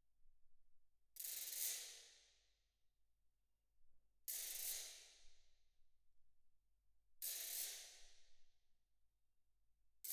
Perc

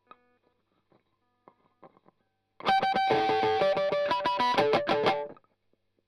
Strum Line

